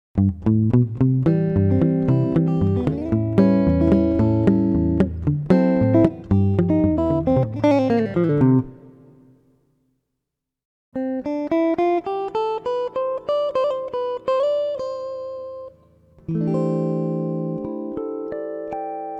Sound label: brass; tru-arc